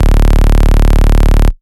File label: Bass Shot D; Mutekki Media; Vengeance Electroshock Vol.2; Bass Shots